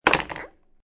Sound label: Billiard Pool Snooker Ball Comes In Hard And Falls Into Pocket; Pool Sound Effects